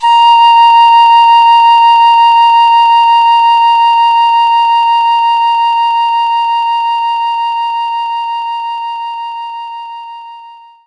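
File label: Piccolo A#1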